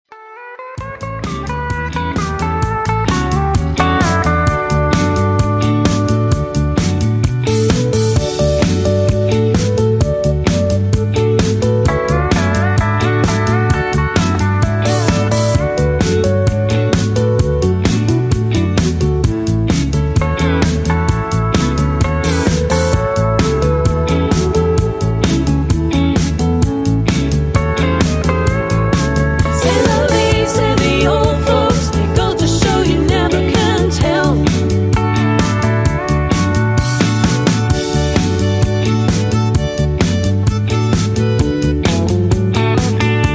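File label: Instrumental Leads with background vocals; Singing Calls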